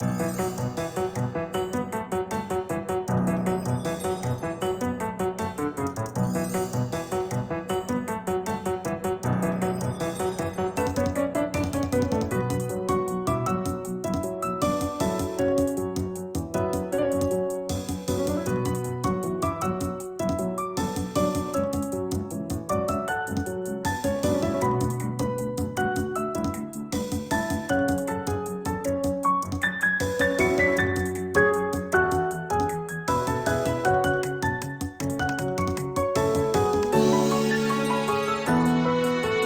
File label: battle